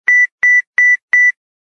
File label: Low Beeps; LG; VX5500